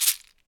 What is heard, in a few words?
SHEKE, Afro Shakers, Shekeres